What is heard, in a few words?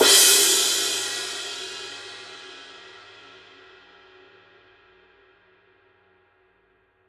formula 602 ME 20 crash edge; paiste; modern essentials